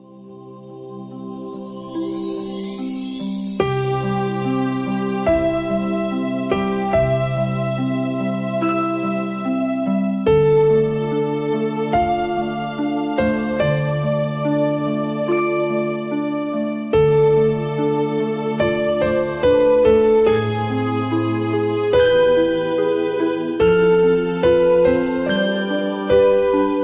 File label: Alarm, alarms